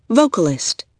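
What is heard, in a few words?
vocalist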